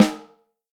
MHT, Vintage Snare Drum; Q Up Arts; Johnny.C's Vintage Snare Drum; 5.5X14 SLR

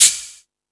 Jungel Percussion, E-MU Pro-Cussion; drum machine

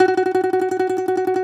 DOMRA, Segundo Domra, Plucked Strings

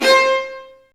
String Sections, Violas Marcato; Roland LCDP13; STR Vas2 Marcato

